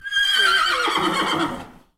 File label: Horse neigh indoor